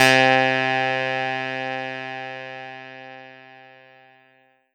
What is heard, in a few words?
Kawai K3 Clav C3